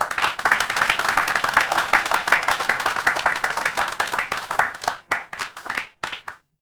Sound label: crowd applause